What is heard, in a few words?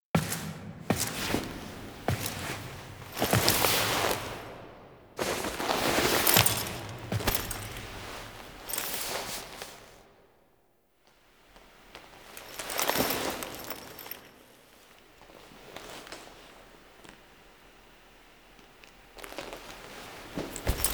Romance Cine Foley; Cinematics; Warframe; Tau Romance Cinematics; Wisp